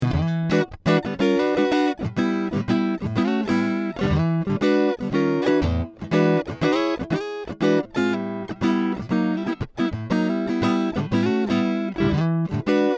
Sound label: Blues Vibroverb unproc; fender